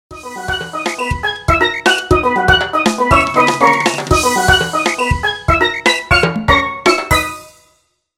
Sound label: ringtone, funny ringtones